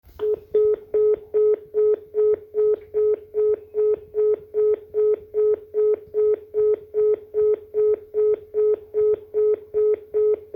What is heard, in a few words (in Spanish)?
ocupado, celular; deathmatch; zn celular